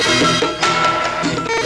Whacked Loop, Percussion Loops

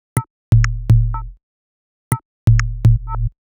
Top A 123bpm, House Drumloops; Zero-G House Fabrik Drumloops

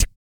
REGGAE, Vocal; ILIO; Vocal Planet; Blues & Soul

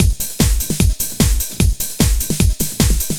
LOOP, Eurotech; Sounds Good

